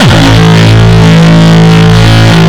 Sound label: Longkick, kicks